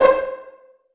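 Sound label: Pizzicato C3 MF, String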